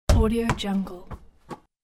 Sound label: basketball board bounce